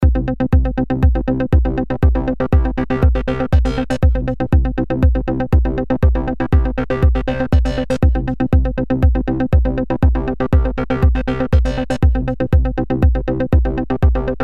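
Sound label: progressive house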